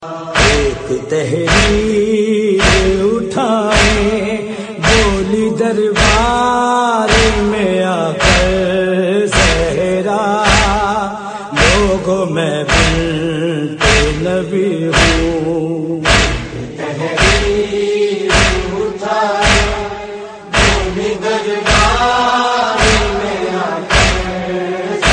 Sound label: noha tone